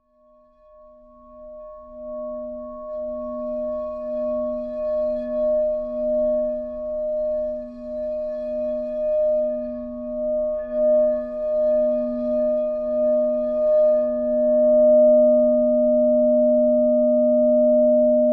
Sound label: cry bowl